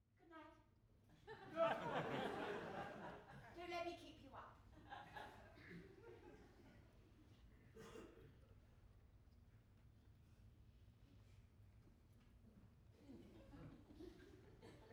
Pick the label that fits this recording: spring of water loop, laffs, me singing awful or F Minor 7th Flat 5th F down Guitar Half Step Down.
laffs